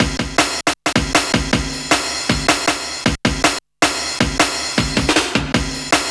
Break, Drum Loops, Jungle, 157; Airi

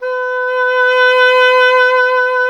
E. Horn

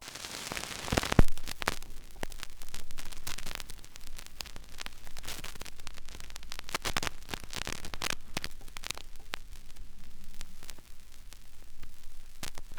vinyl; Masterbits; Vinyl Attack

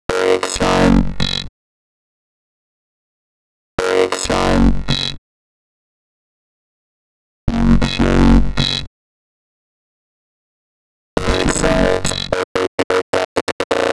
Vocoder; Sonic Foundry (Sony Creative Software); Afterhours EDM

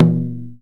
marudo, Japanese drum; AKAI S6000 CD-ROM - Volume 1; PERCUSSION

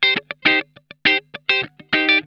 SLICE, Funk Guitar; Zero G - Funk Guitar

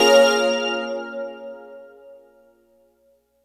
Wavelet Seq; Dr. Fink's Funk Factory

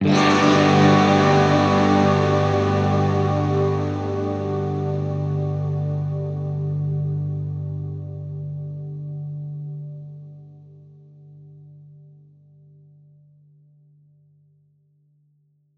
Guitar Chords Gmaj; Zero-G - Future Wave

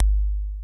ELEC. DRUMS; Crypton - DrumBase5000

